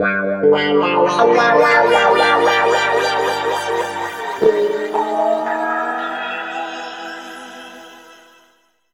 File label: G P-S WA -L, Guitar; East West; Steve Stevens Guitar Samples Collection; PTCH-SHFT+WA